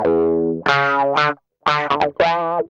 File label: GUI 90 LICKS